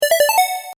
jingle 1up; 8bit; 1機増えたよ！

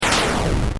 zugan

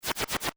propeller

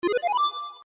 discovery

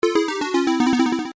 bgm gameover; 8bit; ゲームオーバー; ユウラボ8bitサウンド工房; ゲームオーバー 1

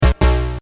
se quiz mistake

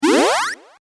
byuin

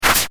attack sword

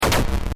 zugyan

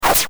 attack sword

